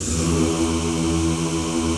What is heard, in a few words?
ZZZ E1, Classical Choir; Best Service; Extended Classical Choir